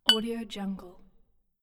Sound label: glass clink